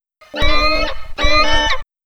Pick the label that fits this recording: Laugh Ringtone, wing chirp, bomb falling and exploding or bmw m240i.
wing chirp